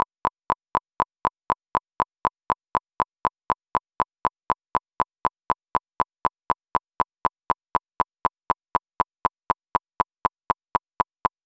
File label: stereo clicks